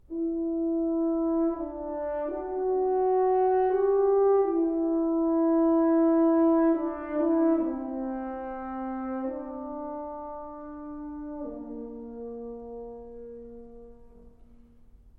Concerto For Horn & Strings, Lento cantabile

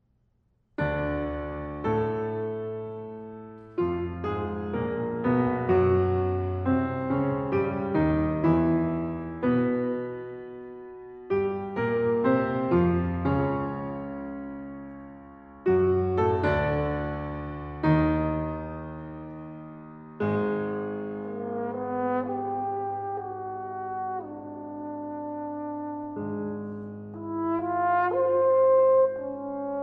Suite for Horn, Tuba and Piano - Paesante

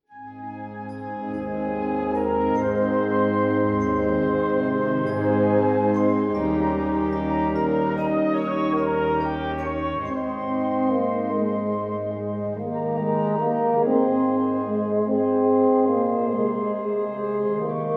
Euphonium and Wind Band, Lullaby

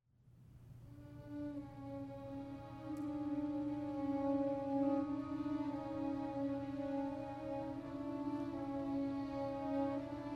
Clarinet Concerto, Clarinet; Black Dog; Clarinet Concerto - Mvt. 2. Elegy

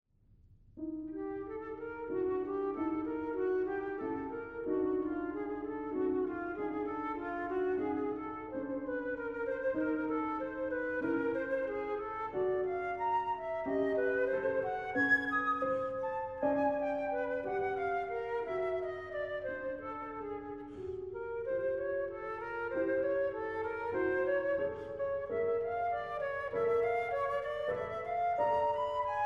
Ballade For Flute & Piano

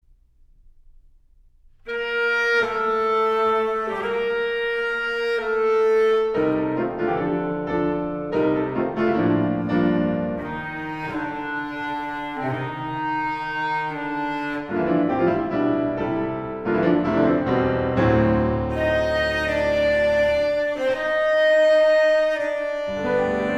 Piano Trio, Allegro vivo

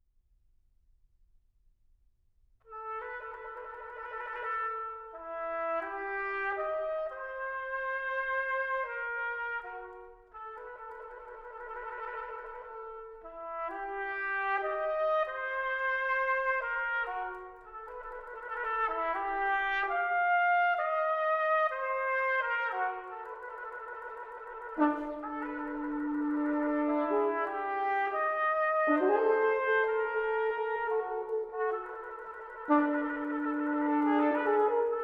Quintet For Brass

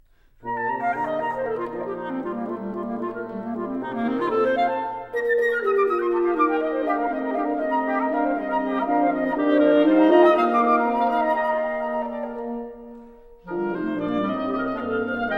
Partita For Woodwind Quintet - Mvt. 2. Variation

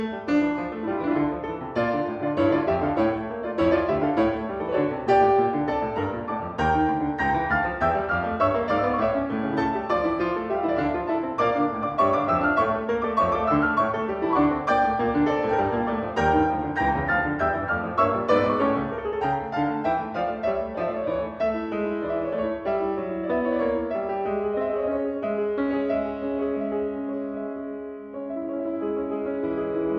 Piano Concerto, Rondo; Allegro non troppo; Brahms

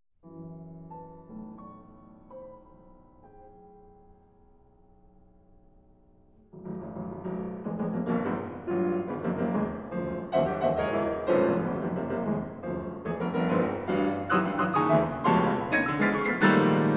Etudes, Slow; Fast, Piano; 20th Century American Piano Music

